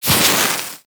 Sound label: Axe Projectile Impact; Warframe; Weapons; Tenno; Sundial